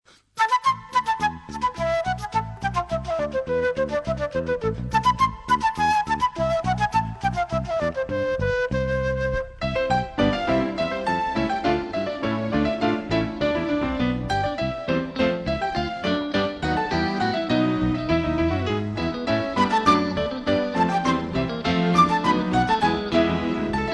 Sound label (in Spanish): bouree electrico, flauta; CANCIONES DE FLAUTA DIFÍCILES PARA GRUPO EN ELÉCTRICO